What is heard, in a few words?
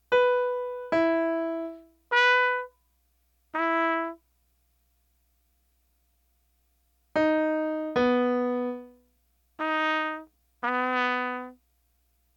ear training intervals melodic